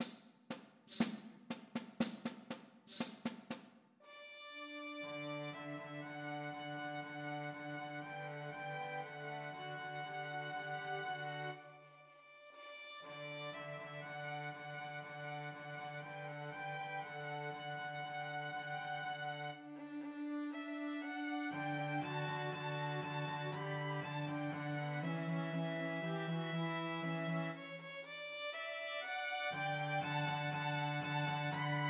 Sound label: Christmas Trios for Two Violins and Cello